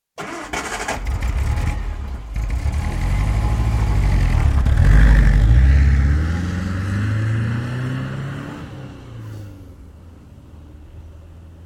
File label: Ambulance, start engine and depart without siren; Emergency Vehicle Sound Effects; BBC